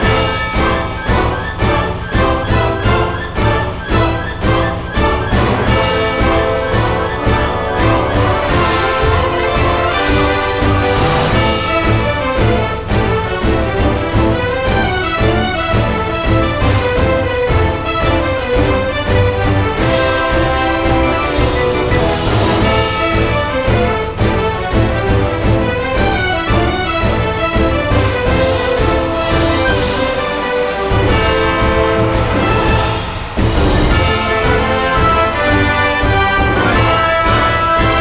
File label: Fight loop; PeriTune Material